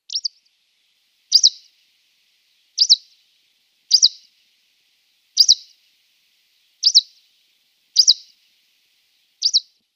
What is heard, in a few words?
Greenish Warbler calls